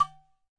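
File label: udu finger, Udu Clay Hand Drum; ETHNIC and WORLD PERCUSSION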